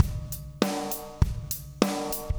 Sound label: breathe; il compressore